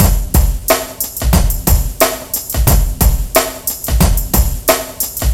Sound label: buck funk, 90bpm